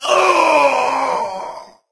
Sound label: wolf; cstrike